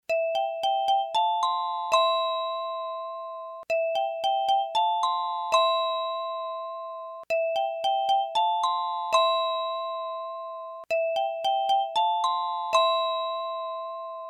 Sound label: Jingle